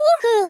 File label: Hoohoo; SM64 CO-OP; Triple Baka Pack; Miku